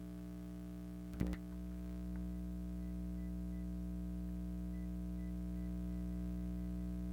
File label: Microwave